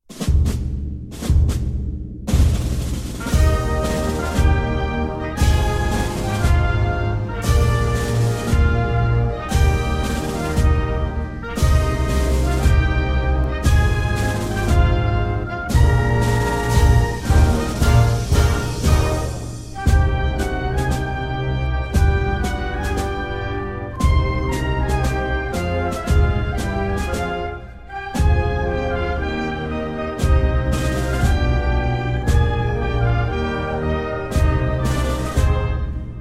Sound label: anthem